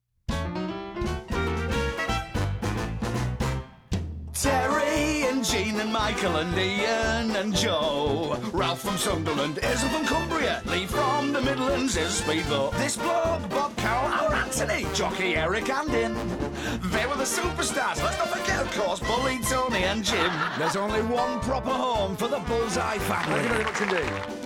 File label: Singing; Bullseye